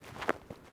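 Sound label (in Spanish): Pasos zapato cesped, shoe footsteps on grass; yesterday origins gamerip 2016 switch ps4 pc ios macos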